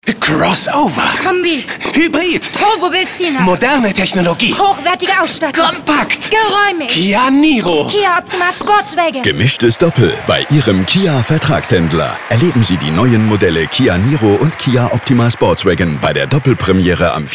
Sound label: funk; Kia; Doppelpremiere